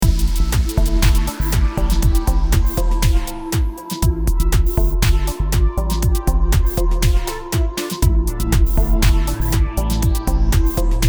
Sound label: loop dark